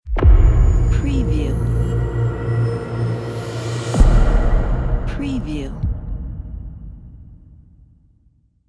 ambient dark logo

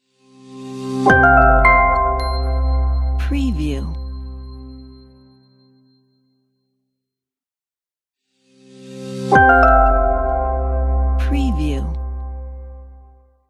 friendly logo

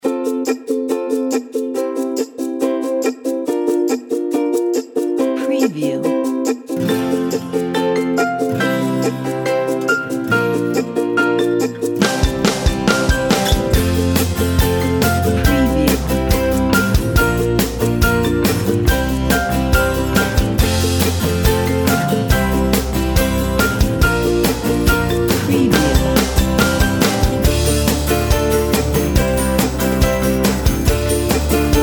upbeat ukelele

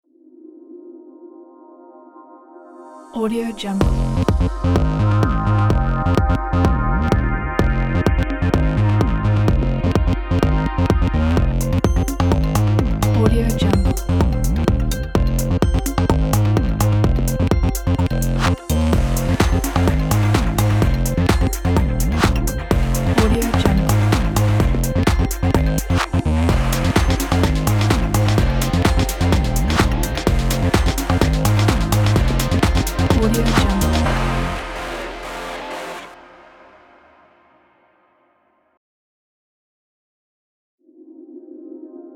sport podcast intro

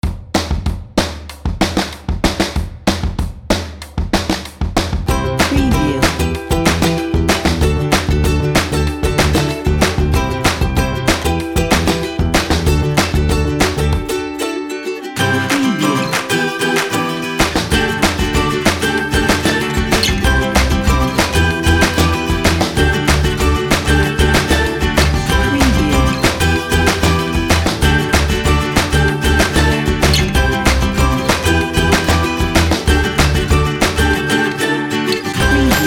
ukulele fun

